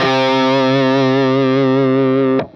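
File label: fuzz guitar vibrato; FUZZGTR1 VIB; Sonic Reality; Vintage Timetraveler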